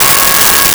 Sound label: Trumpet Db5; Nintendo; July 2020 Gigaleak